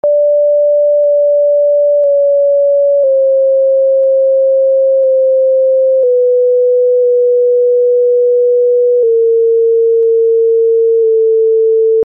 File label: Soprani+Alti range 3 mixed descendant scales 157+138+126 cents; 12 pitch